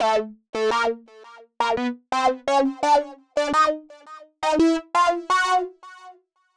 Synth line 85 A, hiphop 85bpm; musicradar

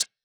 Tech Drum Hit, techno 120bpm

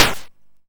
Snare, YM, CHIPSHOP HITS DRUMS; ChipShop Deluxe

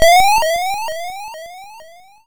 Jump ump; SFX; YM; ChipShop Deluxe; ChipShop Hits

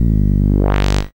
SID Bass G; CHIPSHOP HITS

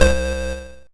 YM Chord C; ChipShop Deluxe; ChipShop Hits

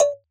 Perc, techno drum

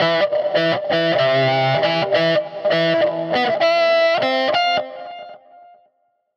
Guitar Line, 100bpm, hiphop; musicradar

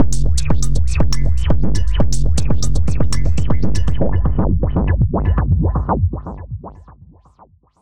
Tech Beat 120 fx, techno 120bpm; musicradar; Beats

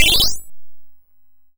YM FX Disappear; SFX; ChipShop Deluxe; ChipShop Hits

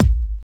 Kick, techno drum

